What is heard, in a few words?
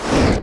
sword